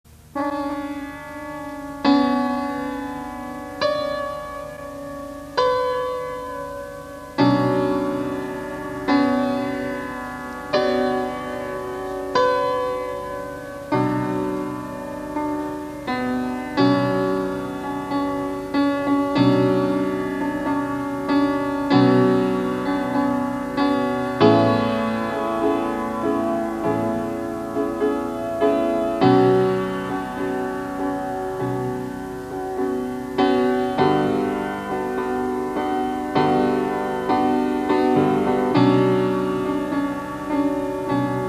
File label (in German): Klavier